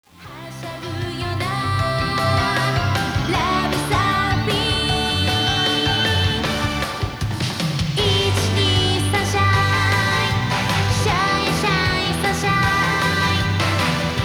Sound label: karaoke bgm; yakuza ryu ga gotoku kiwami 2 pc gamerip